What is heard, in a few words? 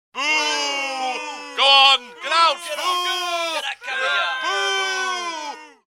Boo Hiss